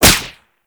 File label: Whip Impact Default; Oraxia Whip